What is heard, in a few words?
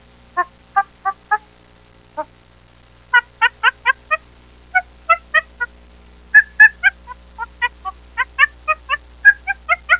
chimpanzee; Animal sounds